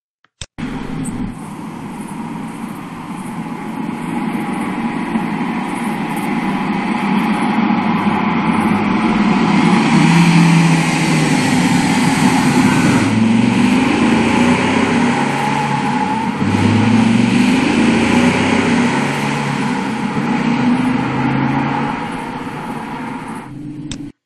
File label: Audi Coupe GT